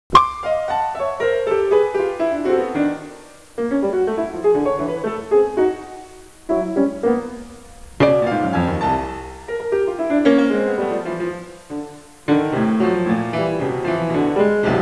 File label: piano